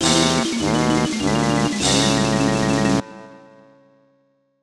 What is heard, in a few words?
Lose Race